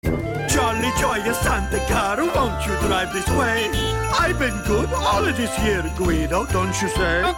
jolly joyous santa car

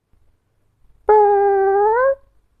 airhorn

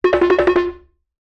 running cartoon

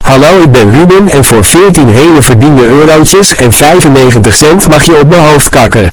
bass bass bass boost

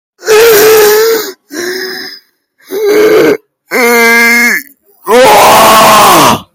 breathing

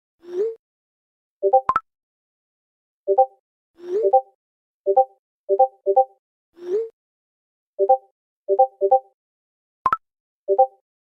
notification spam

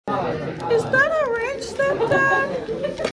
wrench

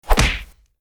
loop punch

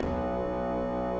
crash sound; a wii music

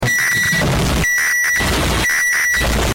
sms telephone

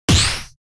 shield parry; smb

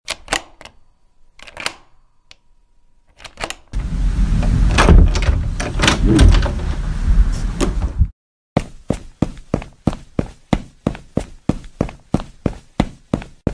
locks breaking doors flung open

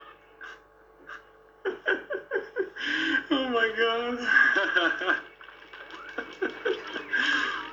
haha omg

